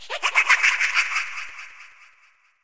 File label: laugh, undertale